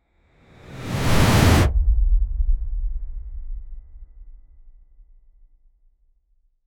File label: 2 sec cinematic whoosh; scene change